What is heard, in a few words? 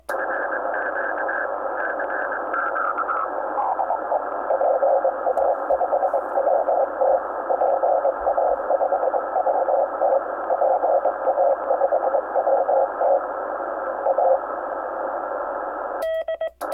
Aurora QSO 6m